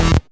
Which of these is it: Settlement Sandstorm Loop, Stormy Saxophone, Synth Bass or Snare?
Synth Bass